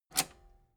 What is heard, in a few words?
metal button robust spring push enable